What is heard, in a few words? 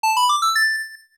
Song Correct; OOT; ZeldaSounds